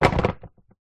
industrial leaf blower electric small set down ground